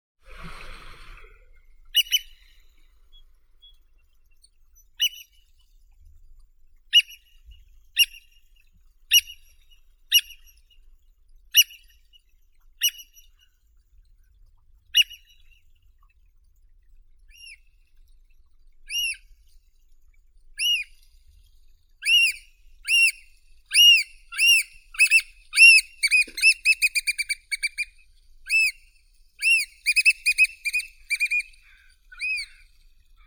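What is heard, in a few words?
black oystercatcher, Haematopus bachmani; kulik soroka chernii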